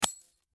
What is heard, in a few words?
glass, bullet hit; metro